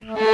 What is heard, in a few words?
alto flute violin melody morph low